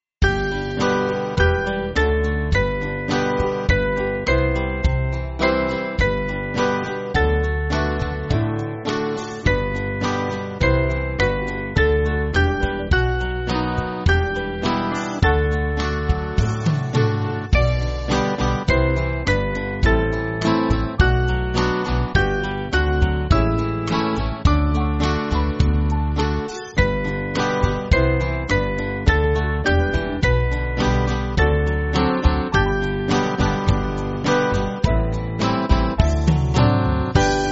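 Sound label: Band, Music Backing; Father We Thank; Les Commandemens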